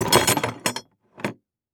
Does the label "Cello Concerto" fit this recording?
No